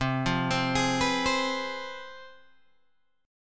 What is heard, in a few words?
C Major 7th Suspended 4th Sharp 5th, C, down, Guitar, Open G